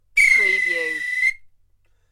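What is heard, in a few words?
Referee Whistle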